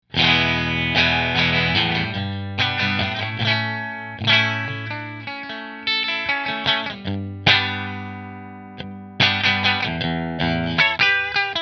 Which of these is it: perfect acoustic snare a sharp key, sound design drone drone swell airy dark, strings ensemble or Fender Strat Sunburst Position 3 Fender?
Fender Strat Sunburst Position 3 Fender